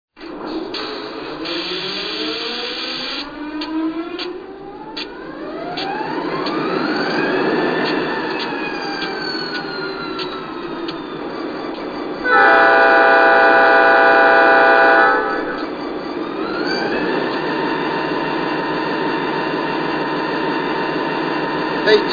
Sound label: EMD GP40